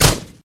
ball fire

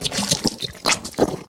zombie meltdown

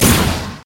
throw ball

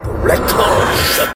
Blackhole shoot

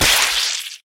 gum hit

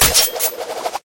Giant rush

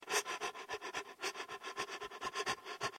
breath

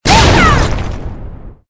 power

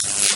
bolt ready

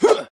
throw weapon

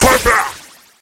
result perfect

